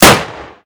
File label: colt Shoot; Minecraft; Infamy; Last Alive Pack